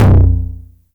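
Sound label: 909 low tom, tape saturation; Toms; Goldbaby Tape808 & Tape909; The Tape 909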